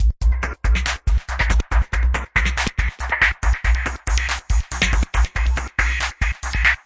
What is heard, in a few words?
140 bpm, tinkling high space syncopation, drum loops and breaks